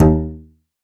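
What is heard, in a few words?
Balalaika D#2 Mute; Multisamples; Digital Sound Factory Planet Earth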